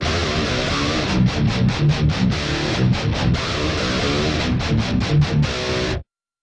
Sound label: trailer riff; riff loop